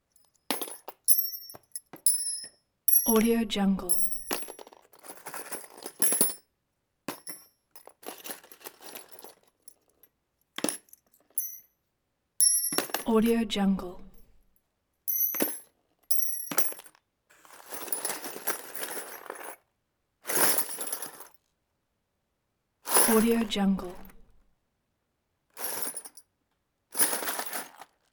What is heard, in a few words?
drill bits dropped into wood box multiple grabs